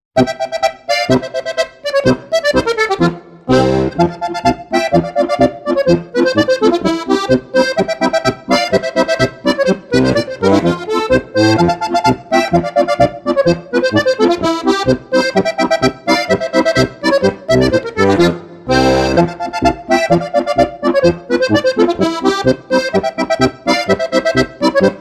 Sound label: polka